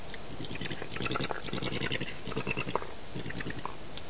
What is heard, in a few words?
purr